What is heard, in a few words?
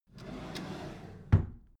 empty drawer smooth close soft